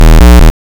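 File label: waveform FM square triangle silence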